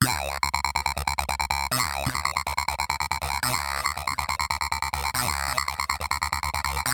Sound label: 150bpm 303 vowel